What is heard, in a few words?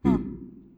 angel grunt